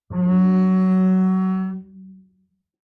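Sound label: Bass arco fortissimo sul A Gb3, Double Bass; MIS Pitches - 2014; Strings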